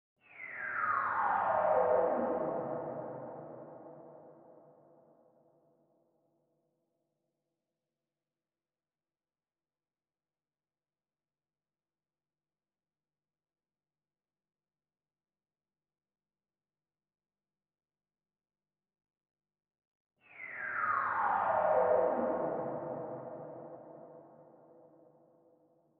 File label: 96 BPM E Min FX; Cymatics; Infinity Melody Collection (Preview); Avarice